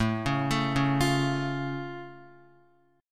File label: A Augmented A down Guitar; arpeggiated; JGuitar